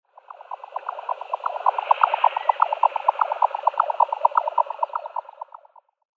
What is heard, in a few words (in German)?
Zwergschnepfe Lymnocryptes minimus; Vogelstimmen